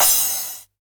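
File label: 909 cymbal tape; Goldbaby Tape808 & Tape909; The Tape 909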